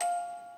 musicbox

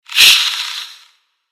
Caffeine Snake, notifications

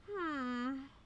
Hmmm; Alice

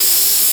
Hat; 音MAD

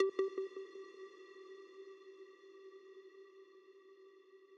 arp

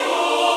choir

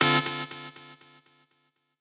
stab

